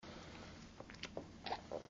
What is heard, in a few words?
slosh; Lewd SFX